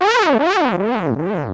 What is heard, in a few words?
morph Time Up; N64; YOSHISISLAND